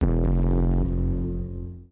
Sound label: BASS SHOT HOUSE 125 A; eJay; Singleshots